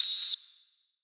Whistle Medium

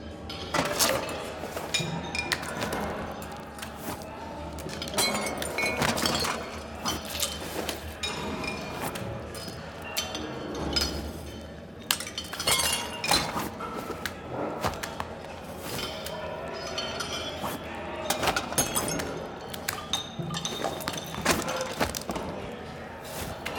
Food Fight LOOP; Prison Architect; DLC GANGS

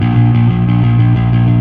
Fuzz A sharp 5

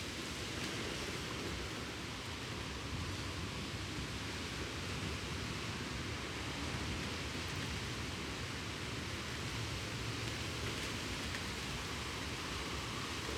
Ambience Rain

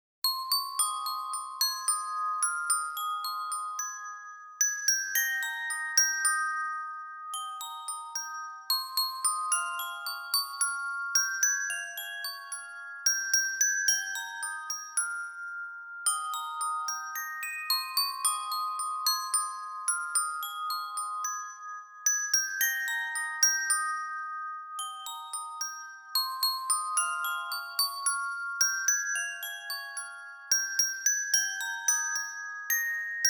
(Music Box Ver.); Ai Cute Kimi ni Koishiteru Original Soundtrack

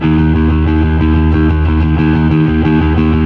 Fuzz D sharp 2

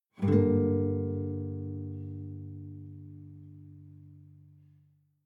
Savannah Music

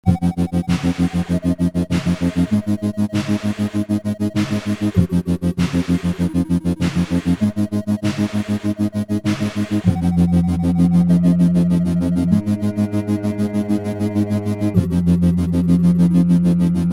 Moar Bass; android ios macos mobile windows gamerip 2012; god of blades

